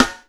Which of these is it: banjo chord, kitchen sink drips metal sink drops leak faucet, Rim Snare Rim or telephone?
Rim Snare Rim